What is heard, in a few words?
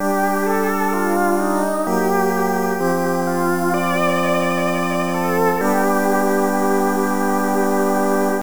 Unknown Jingle; Tengai Makyou Fuun Kabukiden; PC Engine Super CD-ROM2